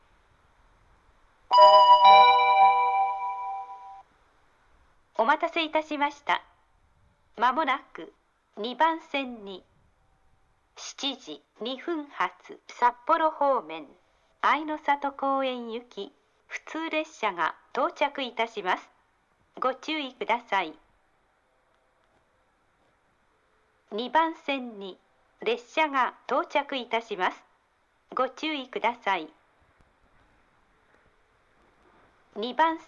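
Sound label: local, train station; shin-sapporo; ainosato-koen; jr-hokkaido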